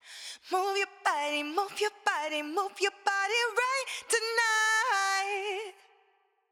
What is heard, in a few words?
vocal chain Plate140